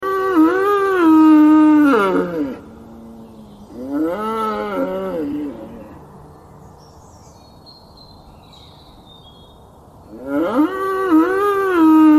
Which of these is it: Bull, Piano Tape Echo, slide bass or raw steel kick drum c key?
Bull